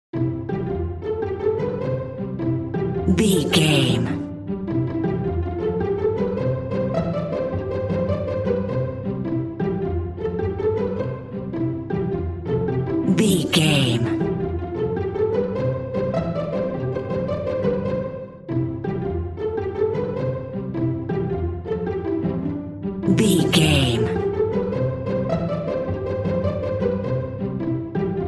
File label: humpty dumpty pizz strings; Humpty Dumpty Pizzicato